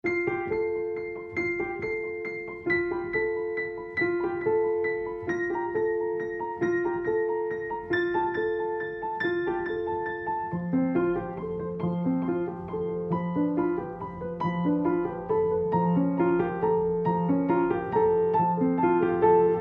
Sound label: Gliding from Ragtime